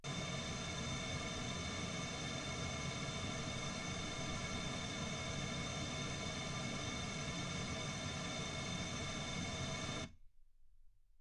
pink noise 48kHz

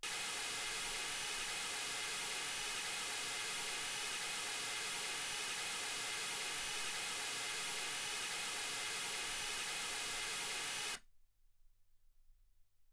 mls16 48kHz

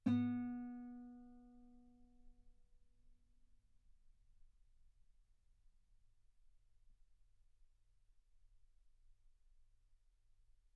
Guitar B3

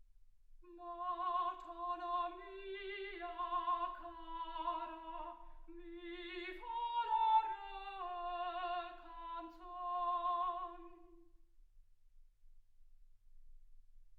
soprano

